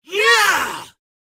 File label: Mob Voice Attack; Bad Brawler